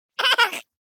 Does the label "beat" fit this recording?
No